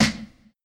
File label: Snare